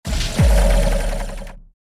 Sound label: Plasma Charging